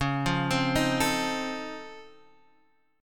C sharp Augmented Major 9th, C sharp, down, Guitar, Open D